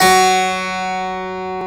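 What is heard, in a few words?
Harpsichord; CMI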